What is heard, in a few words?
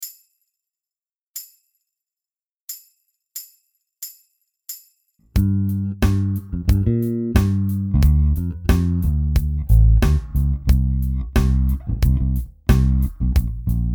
R&B, bass